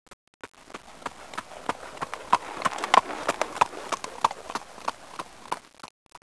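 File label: horse trot; animais cavalos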